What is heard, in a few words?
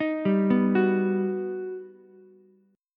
G Augmented Major 7th, Ukulele; G+M7 arpeggiated; JGuitar